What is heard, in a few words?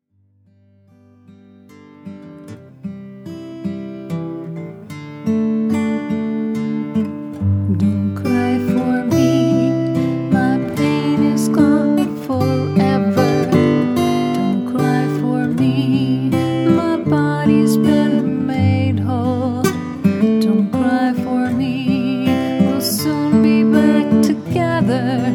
Background with Harmony